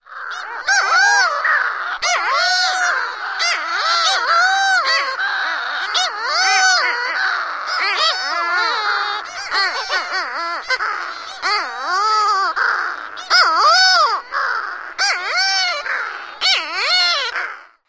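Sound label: Flesh-footed Shearwater pair calling at colony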